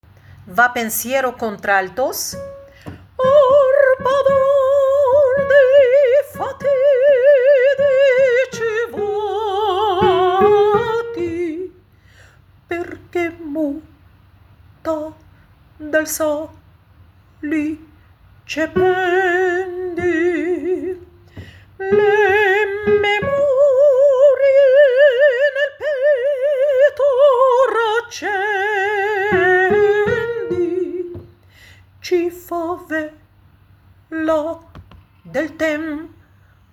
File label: contraaltos; vapensiero